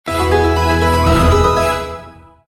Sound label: WIN; Garden Of Riches